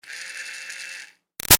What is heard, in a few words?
Toy Fairy Wand Med Far Run Short; Miscellaneous Toy Sound Effects; Toy Fairy Wand 1 Med Far Run Short